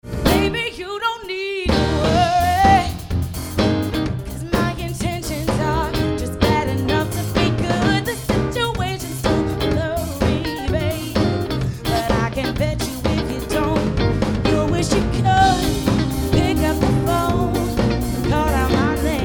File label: Sample RnB Before